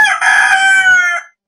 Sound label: rooster crow; Farming